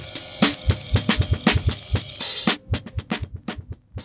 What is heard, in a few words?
space funk, drum loops